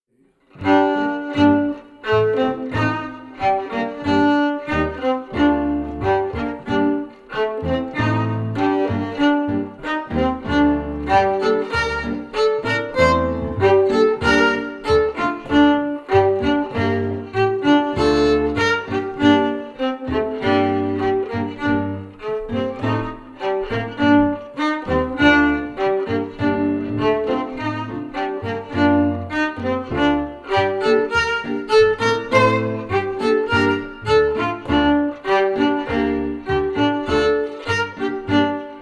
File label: FIDDLE PIANO; Jink & Diddle Book o' Tunes 2021